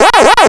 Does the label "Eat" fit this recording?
Yes